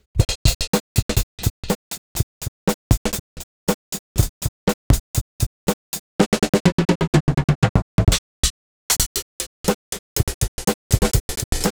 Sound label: Hat Click; Extractor